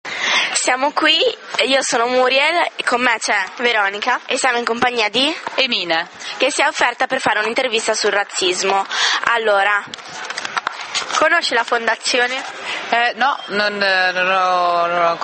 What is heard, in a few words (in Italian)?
pubblico